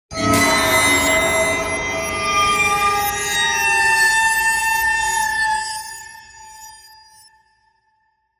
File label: Trailer Tools Metal Scrape; Production Music; CATastrophic